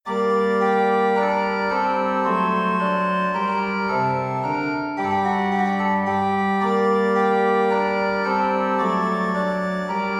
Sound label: Organ Minuet Rondeau in B Flat Major